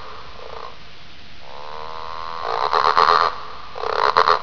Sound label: frog loop, animals; sadpuppy